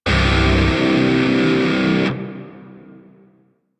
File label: Shawzin Pent Maj Chord; Lotus Shawzin; Warframe